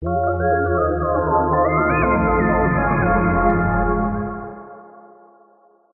synth space weird synthy sci fi melody activate long; Jessey Drake